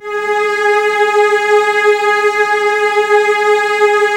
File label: G#3, Strings; ILIO; Kirk Hunter Virtuoso Strings VOL-3; STR TAME